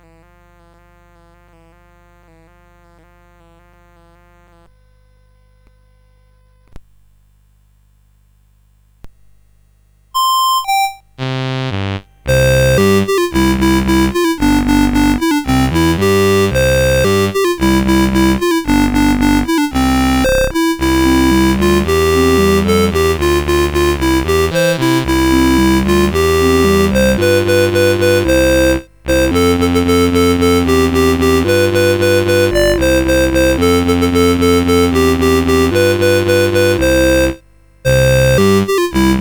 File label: Ritsch Ratsch Filibom, CSG8580R5